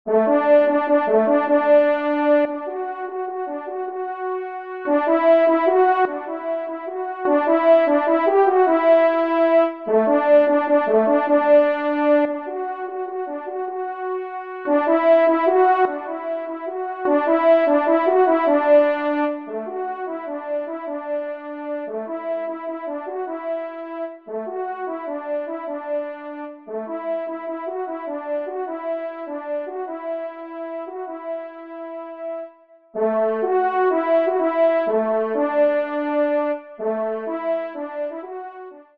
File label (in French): Marche des Chasseurs d Alsace, Do Maj, trompe; F. Schann